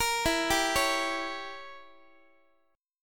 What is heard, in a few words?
E Diminished 7th E, Guitar; Edim7 arpeggiated; JGuitar